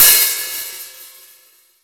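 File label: open hi hat, 14 inch old hi hat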